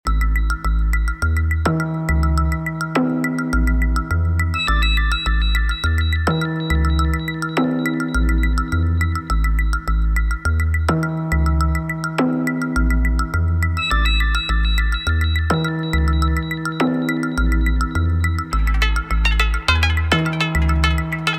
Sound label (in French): jingle